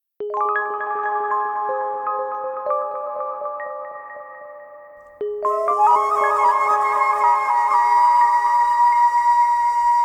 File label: flute